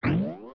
Bounce; Scarecrow; zelda; OOT

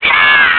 Spin Attack; Link's spin-attack shouts

